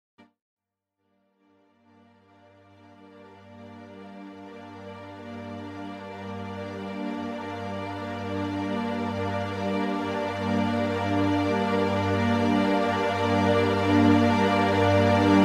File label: ending music; Huebots